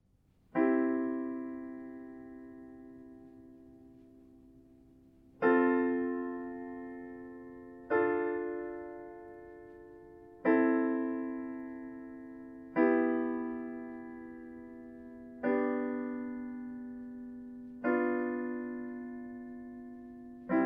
Alternative minor chords

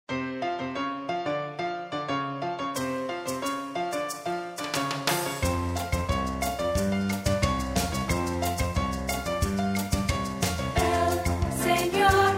Singing Company

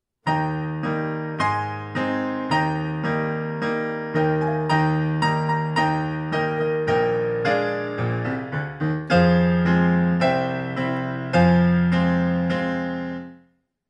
Accompaniment style chordal style in 4 4

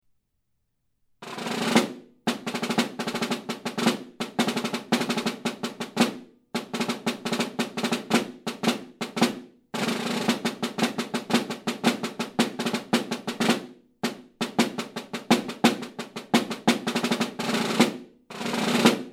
Snare Drum

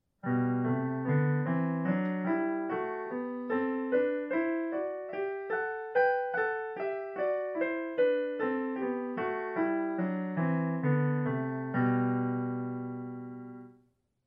Parallel motion in sixths

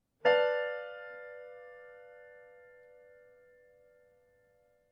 Diminished Seventh

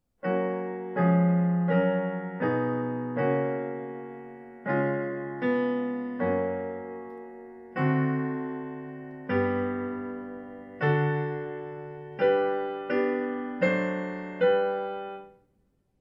Half step lift

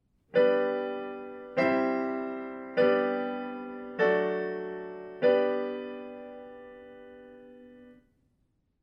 Using 1 4 1 5 1 in G major

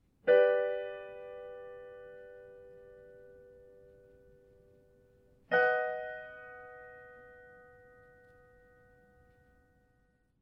Mediant mod up a Major third

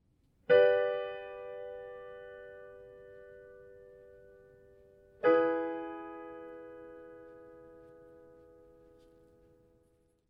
Mediant mod Down a minor third

